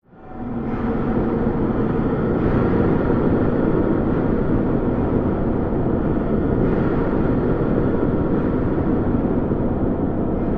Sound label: Dist Heavy Machiner, industrial